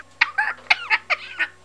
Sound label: cough